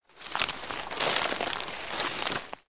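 tiho kradushiesya shurshashie shagi, quietly sneaking rustling footsteps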